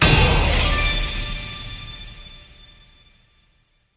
small Win; warlords

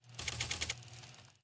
free spin quick; steamtower

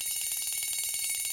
count Up; parthenon

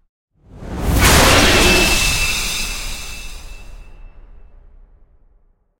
bonus lose devil; bloodsuckers2

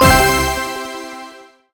win small drop; dazzle

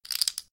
Bonus Chips; casino holdem

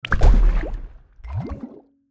win explosion medium; reef raider

